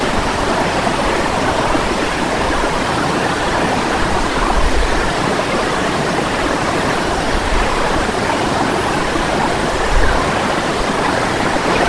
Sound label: waves